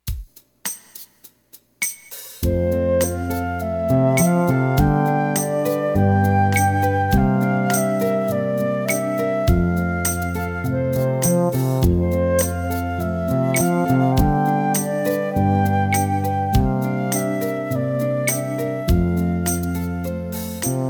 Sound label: Broken Chord Accompaniment